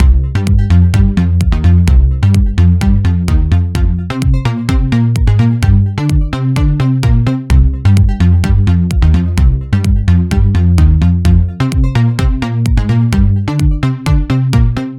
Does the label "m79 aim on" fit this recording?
No